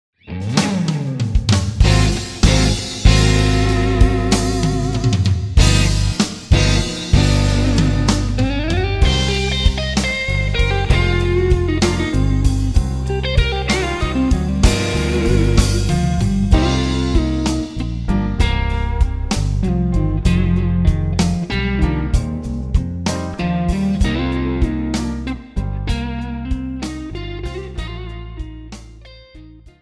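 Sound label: Slues, jazz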